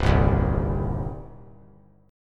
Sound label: C Minor C down; strummed; JGuitar